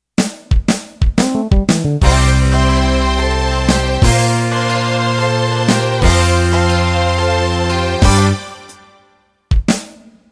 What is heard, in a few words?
Country Shuffle Intro